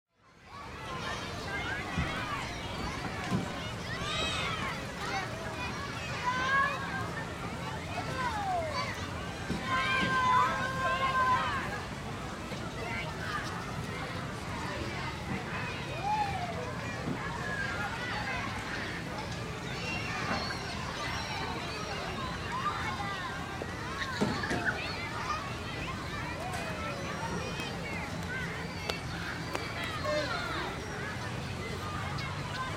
Ambience Beach Children Playing; Ocean; Nature

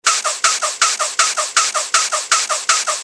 Cartoon Pump Loop; cartoon sound design

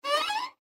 Violin Stab Fast; Cartoon; Cartoon Music; Cartoons; Blastwave

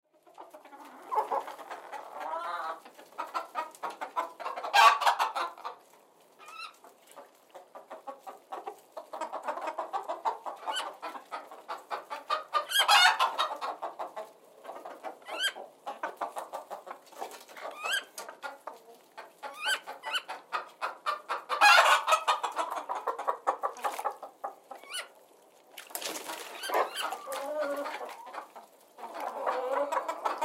Hen House Ambience, Hen House Ambience Chickens; Farms; Backgrounds; Farm Sound Effects; Animal

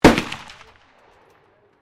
RIFLE 303 FIRING, Rifles, military; Guns

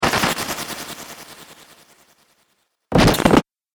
Dubstep Scifi Effects

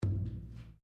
Wooden Column Impact Fist

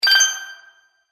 Magic Purchase Ding; Cartoon Sound Design

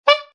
Sax Squeak, A saxophone squeak.; Saxophone Squeak 1; Funny Musical

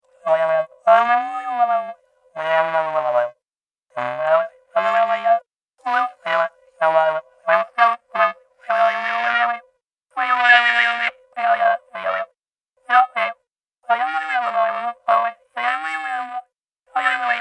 Robot Voice SciFi Talking Small Lonely Sad; Robot Voice Small High Pitched Talking Communication Scifi Science Fiction; Robots

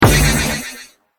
Heavy Laser Accent; Laser Sound Sound Effects

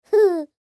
Human Giggle, Giggle Male Toddler; Giggle Short Male Toddler; Laughter; Blastwave

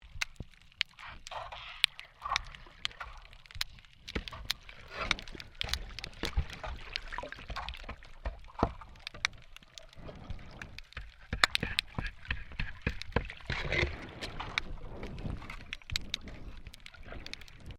MAINTAINENCE WORK UNDERWATER SHOVEL SCRAPING; Construction; Maintainence divers off StKilda Pier

